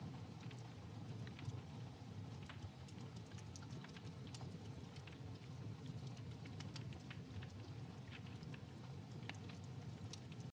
furnace Fire Loop

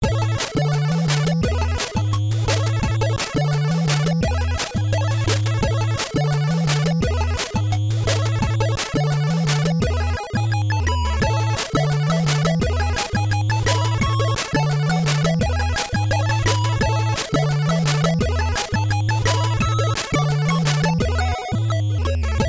Level Music

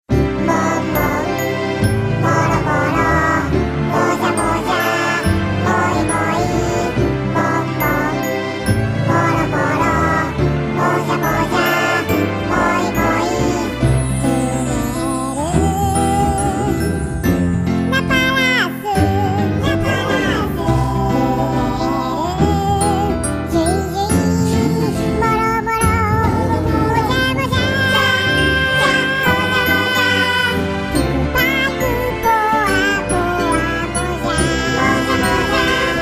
choirs; Loco Roco

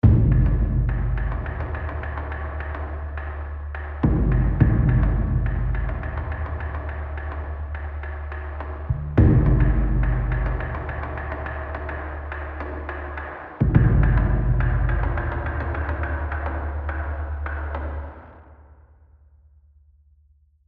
Tension; AB Tension 4 High Block 5

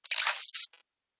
Additional Weapon Sounds

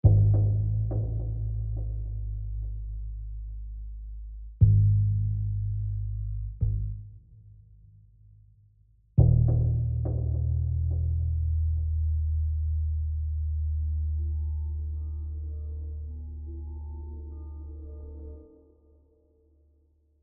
Tension Low

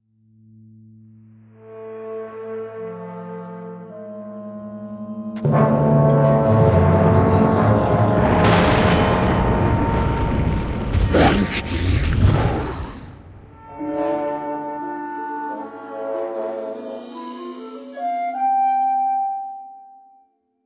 Ending Arcade; sinfinal

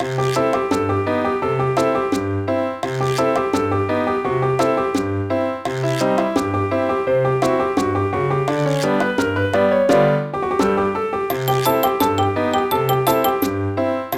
BGM Teach; Kirei Zukin Seikatsu 2; NDS

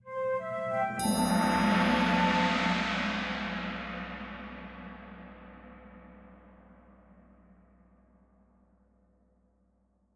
end level

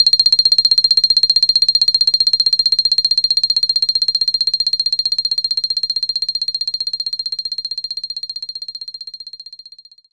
Xylophone C7

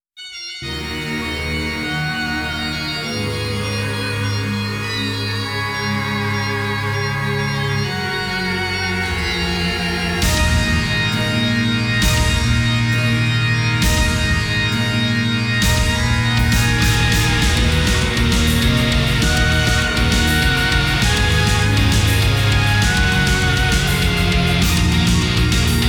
Guitar remix; Kamihime Project

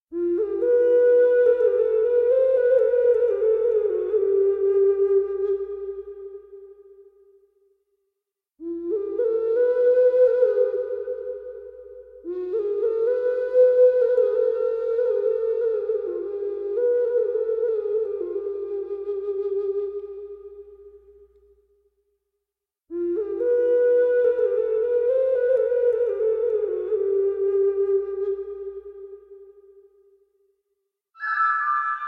Flutes; Flutes Of Bajor

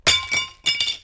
metal; Timelock VR

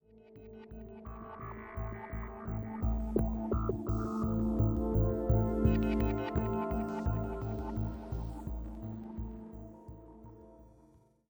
85bpm; Research High; Legends of Pegasus; windows gamerip 2012

